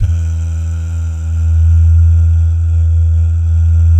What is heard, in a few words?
DAH, Vocal Planet, Jazz & FX, DAHS GUYS; ILIO